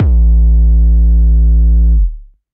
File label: Tunable Boom Drone; Booms; Ableton; Factory Packs; Chop and Swing